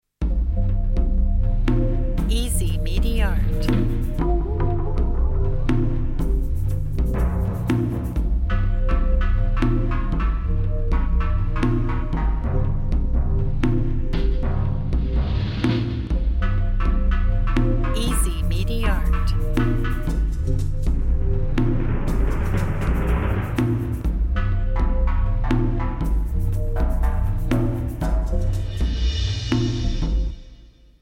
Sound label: jingle news; sounds alike jingle plus 12